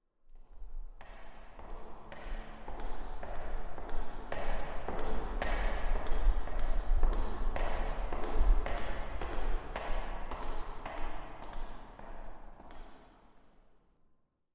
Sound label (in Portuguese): passos com echo; willfred